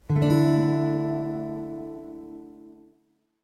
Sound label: d sus2 chord